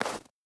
stag footstep snow hard; Rust

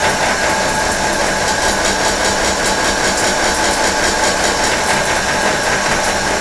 pipe water creak

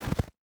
footstep burlap snow jump land; Rust

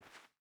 footstep barefoot cloth walk; Rust

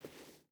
footstep barefoot sand walk; Rust

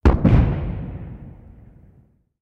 tank explosion far; explosions; War Thunder; tanks

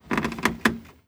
foot wood creak, footsteps

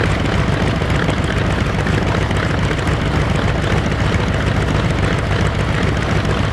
zero rear, engines; War Thunder

